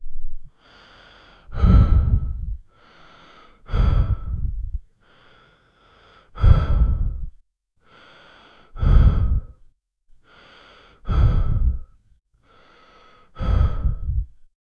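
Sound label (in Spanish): respiración suave pero ner, anxious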